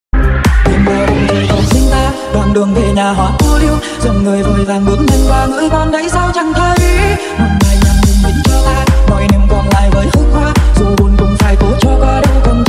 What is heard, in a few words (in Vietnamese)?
Nhac chuong remix